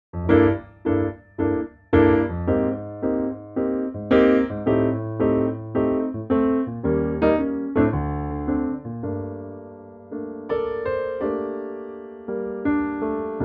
Gm swing Piano; key - Gm - vocal range - F# to A; fresh lightly swinging piano only arrangement

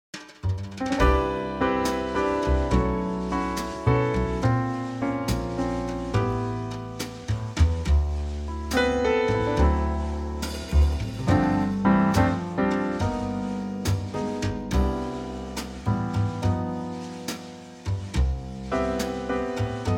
Trio C; key - C - vocal range - C to D

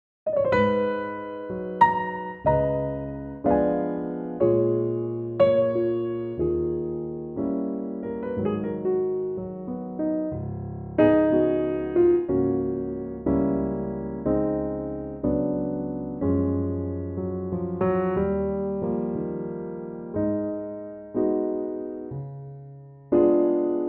Gb piano; Pianotrak; key - Gb - vocal range - Bb to Db; Wonderful standard in a lovely ballad 2016 Pianotrak arrangement

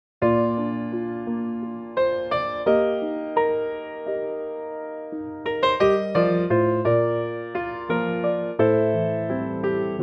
Bb piano; key - Bb - vocal range - Bb to D

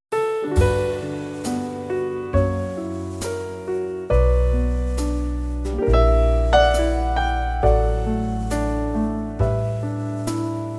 D Trio

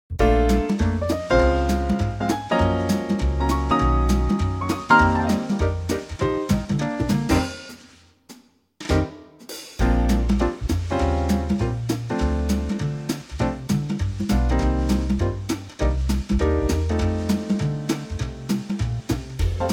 Bb Trio

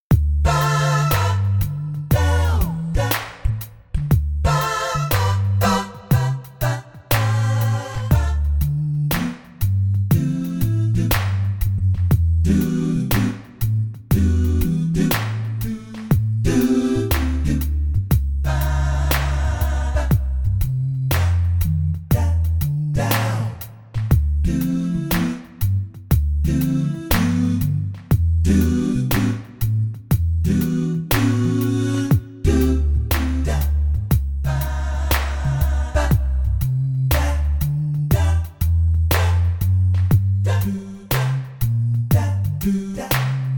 smooth

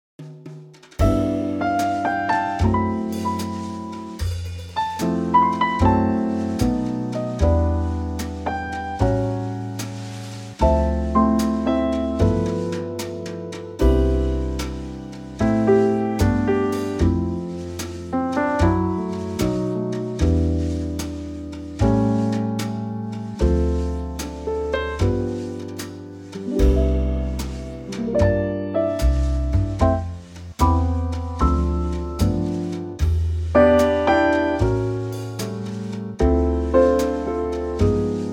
trio C

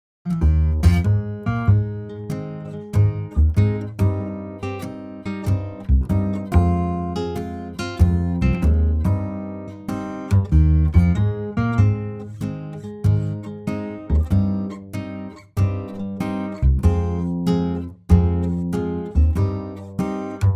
swing Ab; key - Ab - vocal range - Bb to Bb; Two acoustic guitars and upright bass work a treat in this lightly swinging intimate arrangement

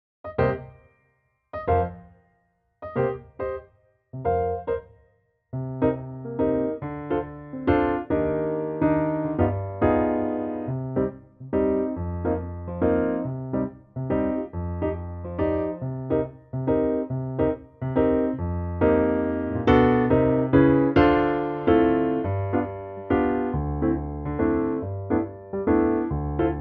Bb piano

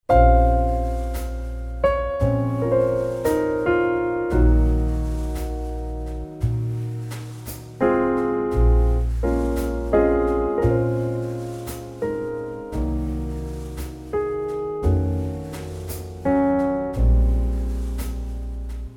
Bb trio

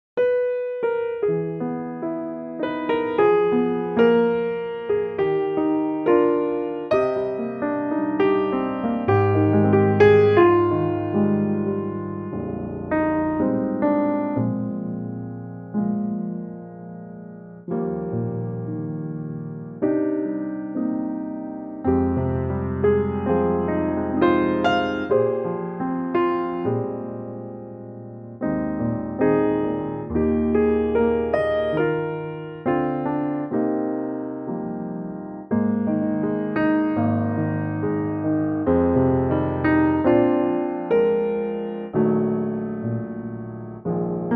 Eb piano; Eb Pianotrak; key - Eb - vocal range - G to Ab; Beautiful and reflective piano only arrangement

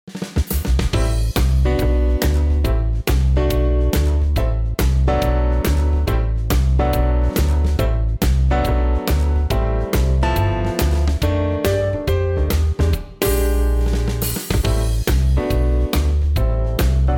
trio; key - Am - vocal range - E to A; Cool new TRIO arrangement, with tasty chord changes included

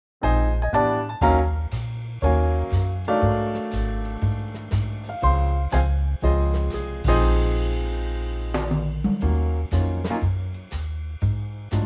Db swing

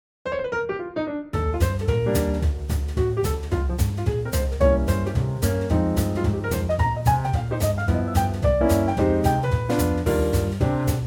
Db 220bpm; Eb version 3; key - Eb - vocal range - Bb to Eb; Very cool Trio arrangement of the popular classic standard, bright tempo at 220bpm

